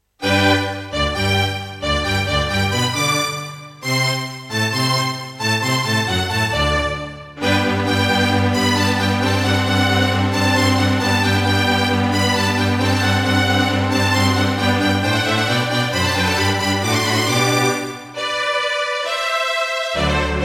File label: Roland CM-300; classical; Mozart; Eine Kleine Nachtmusik partial